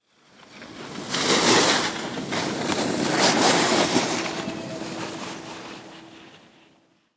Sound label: Snowboard By